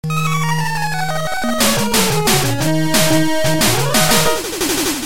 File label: Game Over; Batman RotJ